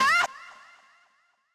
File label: Vox